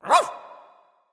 wolf; fenrir

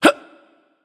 grunt jump; smite; voicepack; khepri

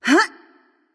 grunt jump light; smite; voicepack; hel

